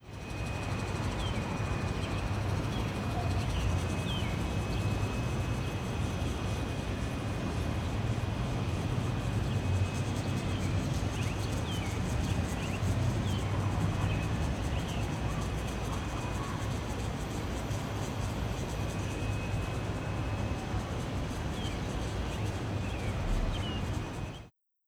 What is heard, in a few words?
Hollywood Outdoor ambience